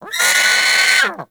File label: Sea Bird Vocal Alerted; Characters; Warframe; Ostron; Hunting Critters